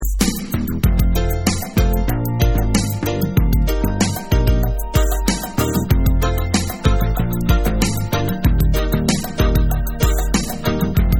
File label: Reggae